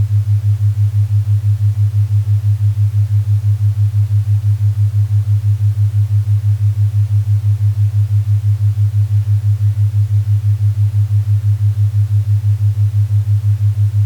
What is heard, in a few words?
Pink Noise Theta 100-106hz